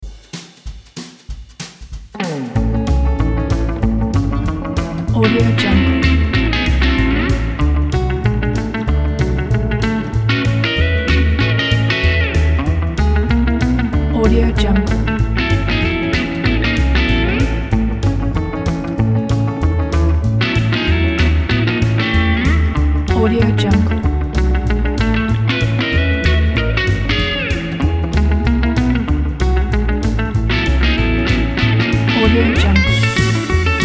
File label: rockabilly guitar; prettycoolmusic